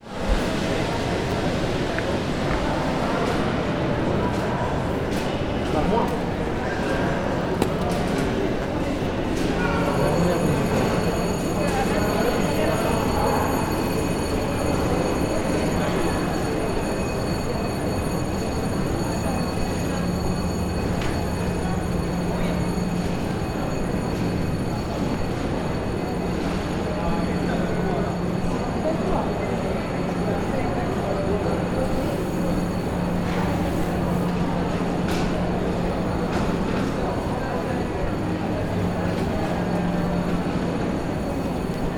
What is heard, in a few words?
Railway Station Crowd